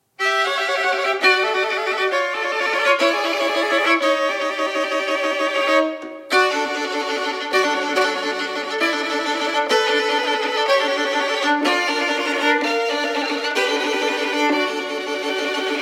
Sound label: theme with 8 variations for solo violin variation viii molto pomposo